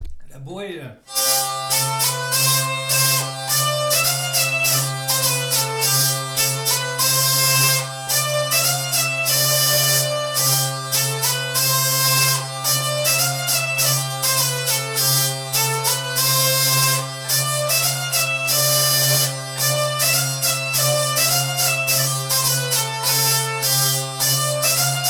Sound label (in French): la bourree des dindes